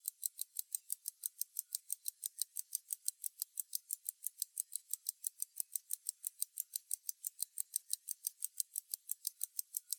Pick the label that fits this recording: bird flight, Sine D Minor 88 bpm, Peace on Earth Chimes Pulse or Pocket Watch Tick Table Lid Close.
Pocket Watch Tick Table Lid Close